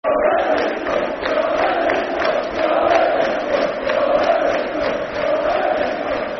We All Hate Leeds Scum, Football Chant (Soccer Song); Bradford City Bcfc